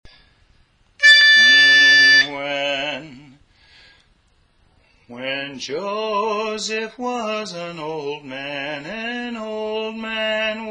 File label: Lead; Cherry Tree Carol